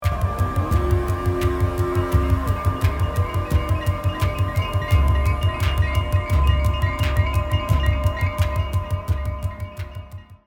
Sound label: moog memorymoog